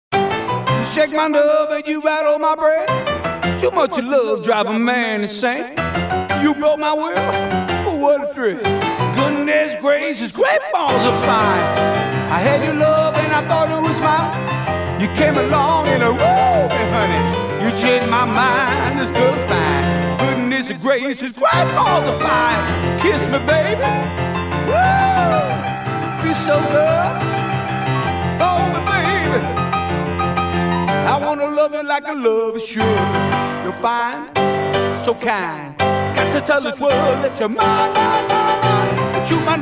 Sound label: Solo Piano